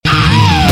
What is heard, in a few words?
ice cream notif; pink